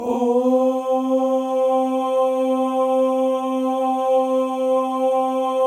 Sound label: male ohs; Spectrasonics; Symphony of Voices